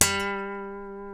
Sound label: A, funk guitars; Spectrasonics; Hans Zimmer Guitars VOL-1; TAKA FUNK